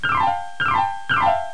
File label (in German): schrill